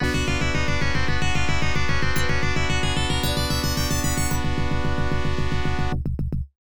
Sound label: Unused Jingle; super x mitchell version; arcade